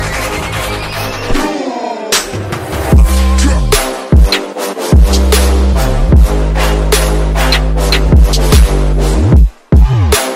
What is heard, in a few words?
cool ringtone